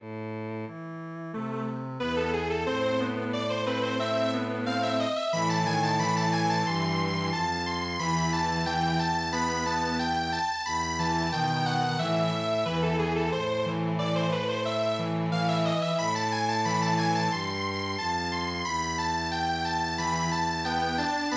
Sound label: Rondo Strings Quartet; midi; traditional